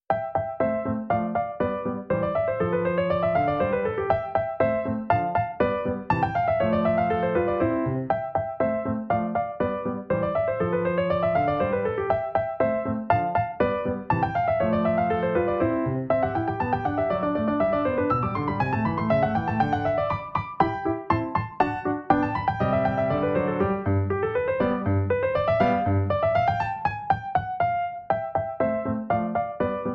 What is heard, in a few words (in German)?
Klaviersonate KV 545 3. Satz; Mozarts